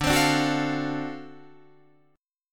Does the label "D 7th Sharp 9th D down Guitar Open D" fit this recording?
Yes